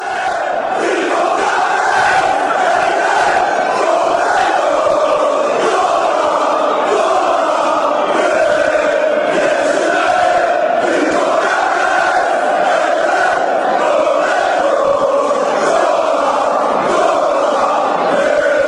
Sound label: lech posnan chant